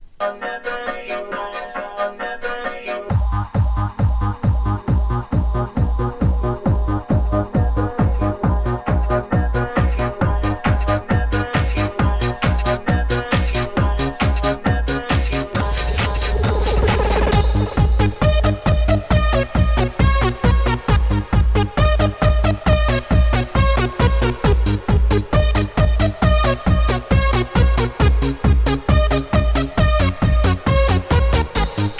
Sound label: DANCE HITS